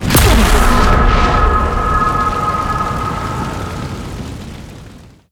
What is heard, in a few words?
e skill explo